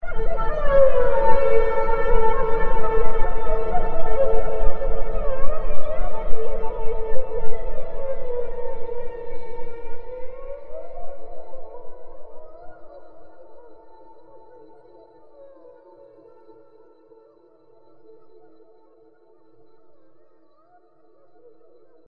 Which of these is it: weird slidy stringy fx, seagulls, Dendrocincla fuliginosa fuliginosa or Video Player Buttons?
weird slidy stringy fx